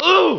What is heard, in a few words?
react; mk2